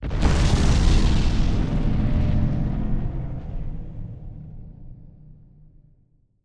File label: void worms system destroyed; Stellaris; grand archive